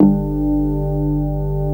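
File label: CX3 organ G1 L, Keyboards Old School; USB Soundscan vol.09 - Keyboards Old School; AKAI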